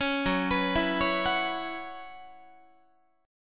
F sharp 7th Suspended 4th, Guitar; arpeggiated; F#7sus4; 16,14,14,14,17,17; 4A,4E,5A,5D,5G#,6C#

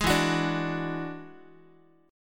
E 9th, Guitar; E9 strummed; 11,12,9,12,x,x; JGuitar

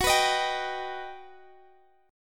G Augmented Major 7th

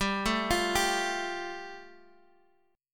G 7th Suspended 2nd, Guitar Standard; x,10,7,10,8,x; arpeggiated; capo=3

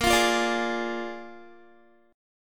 A sharp 7th A sharp, Guitar; strummed; chordshape; 17,20,17,19,20,x

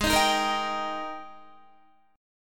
Ab Major 7th Suspended 2nd Ab down Guitar; strummed; JGuitar

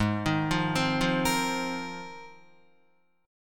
Ab 6th Add 9th Ab, Guitar; Ab6add9 arpeggiated

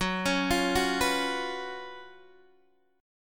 Gb Major 7th Suspended 4th, down, Guitar, DADGAD

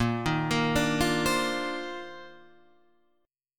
C Minor 11th A sharp

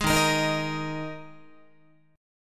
D sharp Suspended 4th, Guitar; D#sus4 strummed; JGuitar

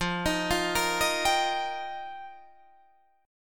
F 7th Suspended 2nd Suspended 4th, Guitar Drop D

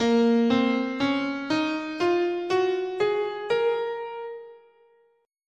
A sharp Aeolian, scale

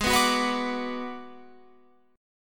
Ab Minor 7th Flat 5th Ab, down, Guitar, Open F; Abm7b5; strummed

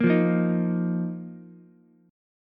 Bb Suspended 4th Bb down Ukulele; Bbsus4 strummed

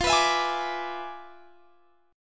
E 7th F, guitar; E7/F; strummed

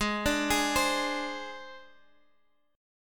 G sharp Major Flat 5th, Guitar Half Step Down

